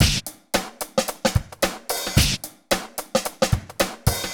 HELP, LOOPS; Best Service; Hyper Dance

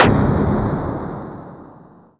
Pit Snare; Quasimidi Rave-o-lution 309

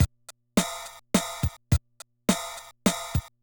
Loop, classic machines, rpm 40

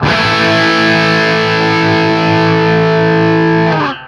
5TH A, Guitar; East West; Steve Stevens Guitar Samples Collection

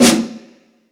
Stadium Rox, Drum Machines, E-MU Pro-Cussion

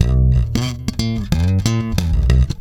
SFNK C#, Bass, FUNK; Spectrasonics; Bass Legends

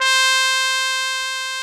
trumpet C4; MONO BRASS; Greytsounds VOL-1

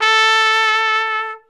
SOLI TRP, Alpine Volksmusik TROMPETEN

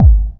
BD, Dance Mega Drums; Best Service

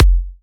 Electro Kicks

